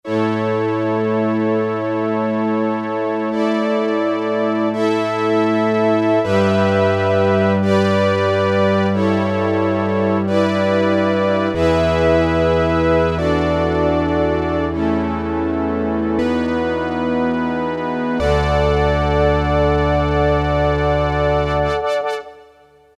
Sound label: Korg DSS-1 Strings